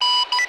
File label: remote start, radio sounds